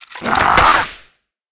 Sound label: cast spell; Fate The Cursed King; MONSTERS; TKALA